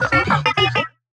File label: Tumble